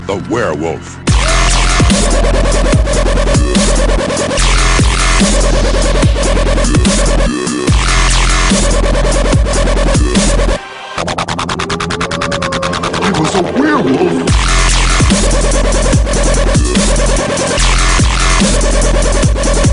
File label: Dubstep; Werewolf